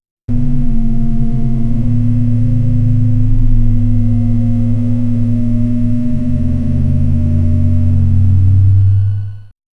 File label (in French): un son dnorme machine qui, retrofuturistic